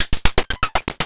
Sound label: Rhythm